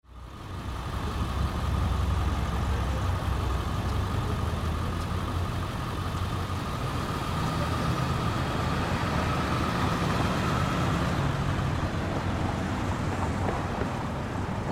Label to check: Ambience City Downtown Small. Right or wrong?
Right